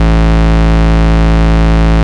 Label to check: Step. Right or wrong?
Wrong